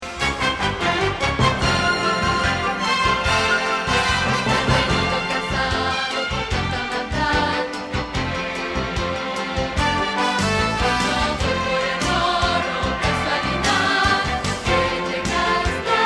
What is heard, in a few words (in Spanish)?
Contralto